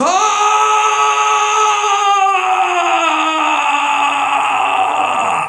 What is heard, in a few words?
fall, player male